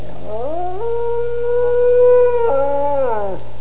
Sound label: Wolf howling in the distance